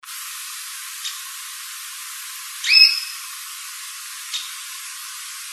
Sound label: Flycatchers; AZFO; Wolf